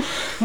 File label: NOISE, CLASSIC VOX; Best Service; Hallelujah